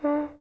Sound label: HORN